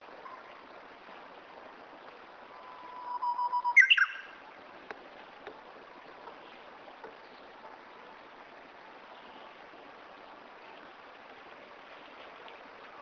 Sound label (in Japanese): uguisu